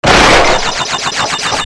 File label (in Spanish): comprar item; deathrun dg